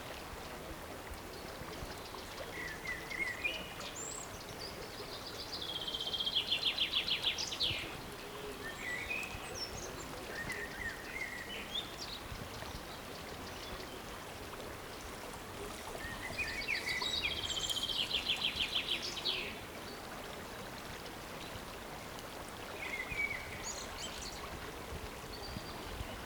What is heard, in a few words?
Birds Near River